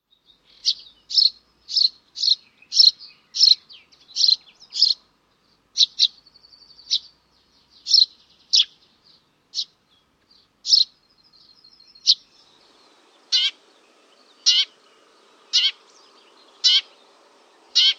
Pikkuvarpunen; varpusen ja pikkuvarpusen

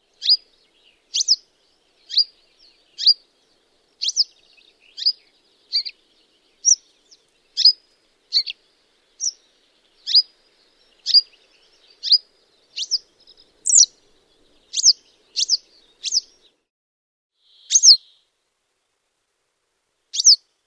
keltavästäräkki laulua ja kutsua; Motacilla flava; bruun

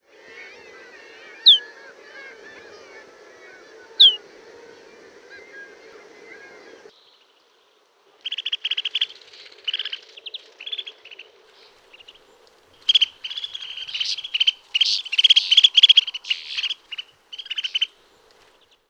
pulmunen kutsuaania; heleita tiukuja

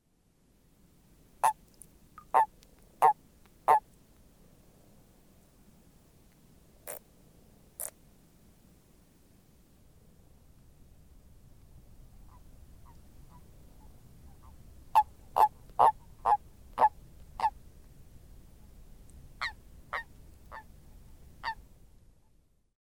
Bufo bufo rupikonna, urputusta; sammakon kurnutusta

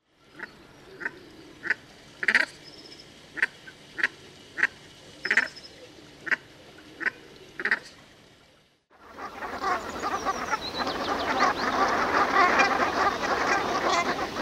sepelhanhi; arktikan aanet; Jan-Erik Bruun

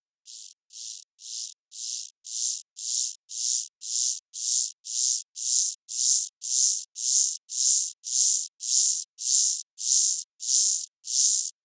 nuijaheinäsirkka

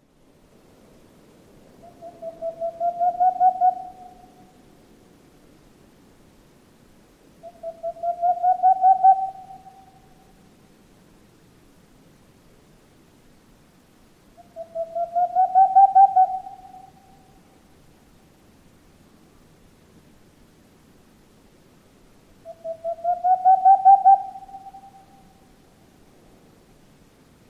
helmipöllön puputus kantaa kauas; hallikainen

